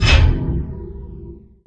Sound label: STOVE HIT; Rarefaction; Poke in The Ear With A Sharp Stick